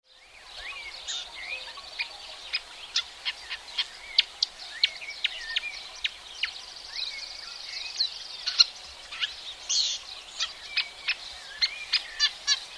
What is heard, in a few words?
marsh warbler